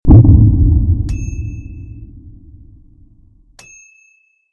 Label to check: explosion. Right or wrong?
Right